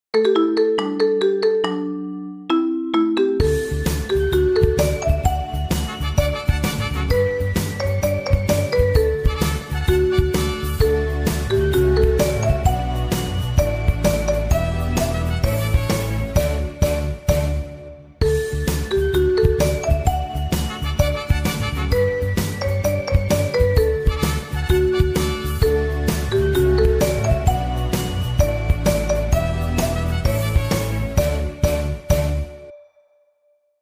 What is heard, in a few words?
marimba remix